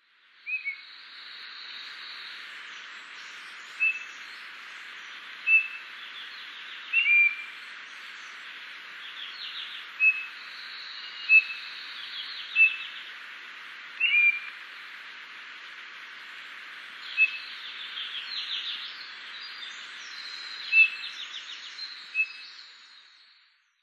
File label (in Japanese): uso, nigorigo